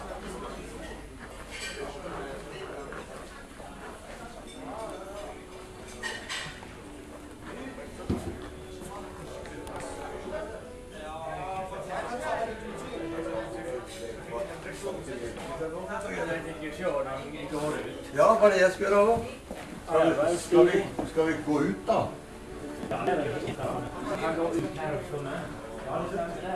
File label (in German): observation lounge floete